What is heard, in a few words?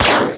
Gunfire; RivalBall for Macintosh